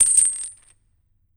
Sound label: Spectral Chains Hit; Damned